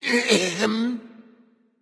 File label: clear throat; Smite; cabrakan